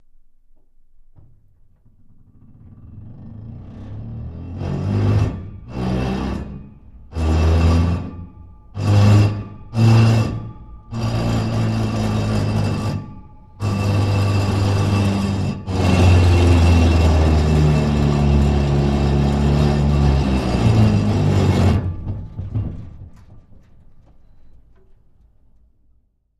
Car Transmission, bad transmission

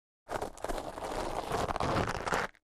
Object Dragged Acros, rocks & dirt

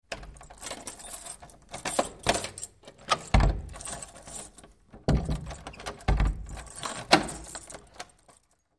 Heavy Wood Door Close; latch, metal

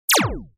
Laser Gun Blast Clas; laser fx

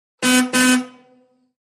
33 Pontiac Horn Blow; 1933 pontiac

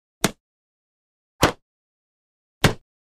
Arrow Impacts, bow & arrow; Three Separate Quick Impacts. Short, Very Fast Whoosh Followed By Sharp, Low Pitched Thud Type Arrow Impacts. Medium Perspective.

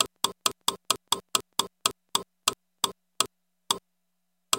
Tick Tock Sequence Slowdown

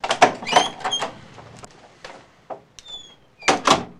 Door Semi Hvy Open Cl

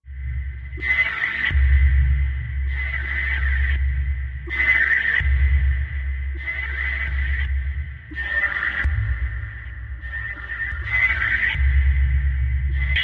Air Drainage Machine

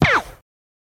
Machine Gun Silenced, silenced bursts

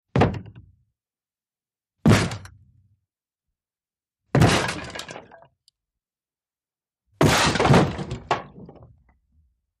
Bash Dwn Wood Door

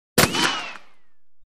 Bullet Ricochet Off D

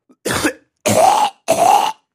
Snotty cough gags; coughing & spitting

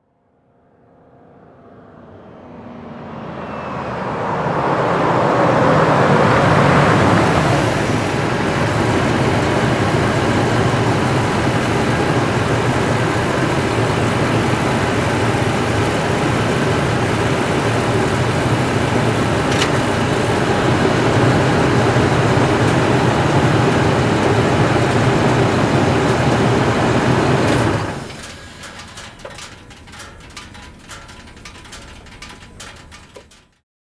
Expedition Fast Idle, ford expedition

